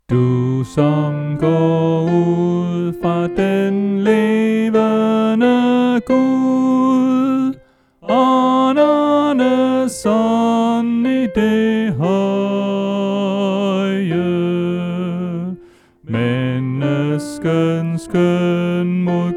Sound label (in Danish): Sopran